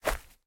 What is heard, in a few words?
Step Grass; MiSide